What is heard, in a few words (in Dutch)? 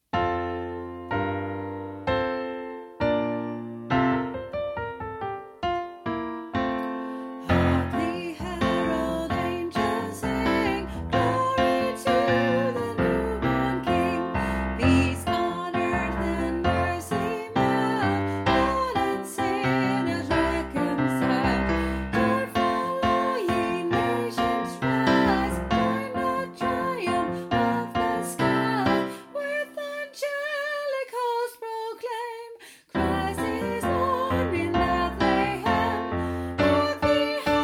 Hark sopraan